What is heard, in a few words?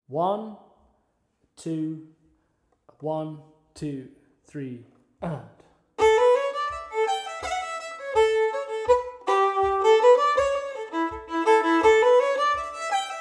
Fiddle Full Speed